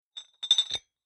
Bar Wine Glass Liquor Decanter Closed Glass Rattle; Tom Hutchings